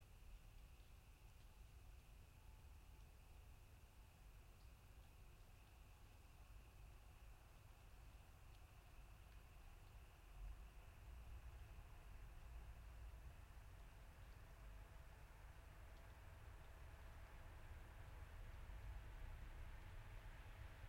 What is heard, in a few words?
yamanakako sound